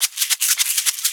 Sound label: Percussion Loops, Shaker & Tamb; Houseworx